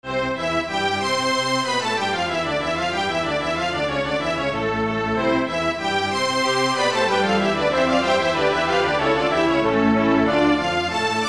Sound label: Rondo; dq3